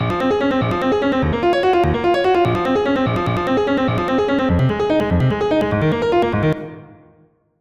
Artcore piano loop

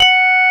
Korg CX3, C 1

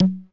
RX21L LO CON; Hollywood Edge; The Big Bad Beatmachine

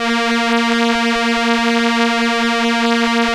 STR ELKA, Elka Strings, Elka Violins

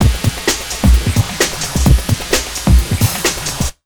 JUMP 130, Big Beat Drumloops; USB Soundscan vol.25; AKAI

